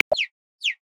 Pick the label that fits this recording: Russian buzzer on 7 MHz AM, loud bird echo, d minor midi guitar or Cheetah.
Cheetah